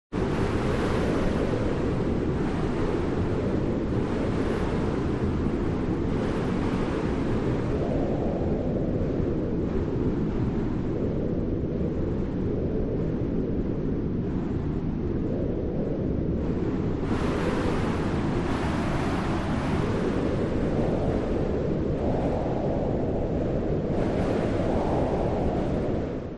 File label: WIND Cold Exterior; ABSTRACT; smartsound